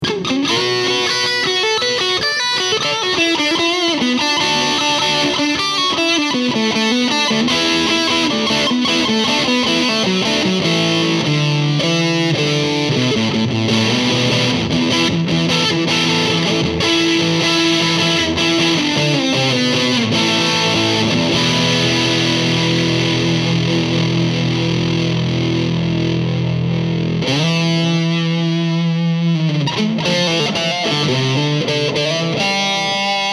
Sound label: BRICK MUFF with TCNova Delay SSL5Strat ReevesCustom50